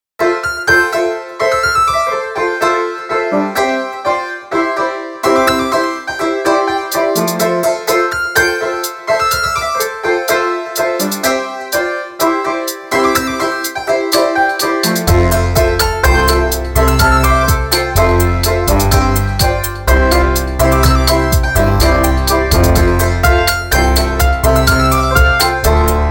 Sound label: room piano